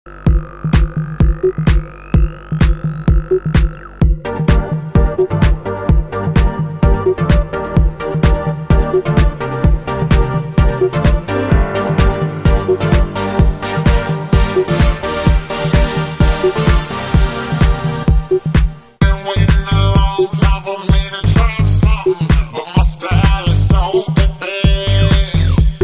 Hip Hop Rock

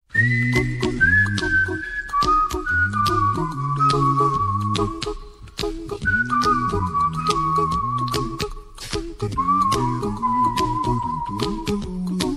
Happy Whistle

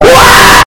Waaaaa

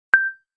Beep

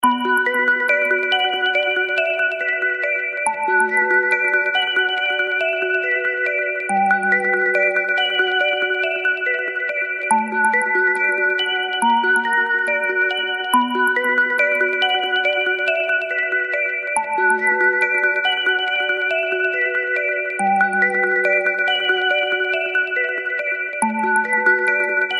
Beautiful Ringtone

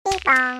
Bing Bong; Yoshi

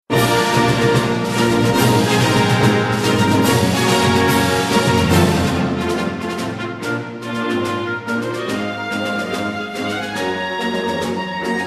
Orchestrated; Zelda

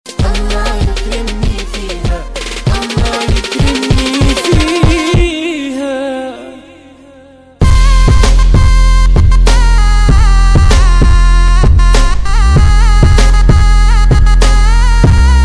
Arabic trap zamil

